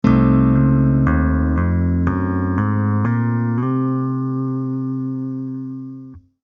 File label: Locrian